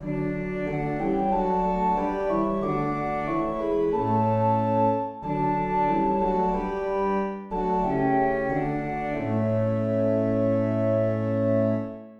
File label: Organ, hymns without words